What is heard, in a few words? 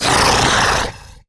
Pain, Barks; Warframe; MiTW